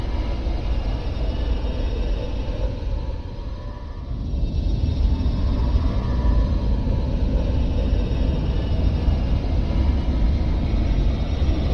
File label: space, ambiances